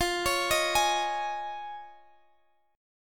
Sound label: F Minor 7th Sharp 5th, Guitar, Half Step Down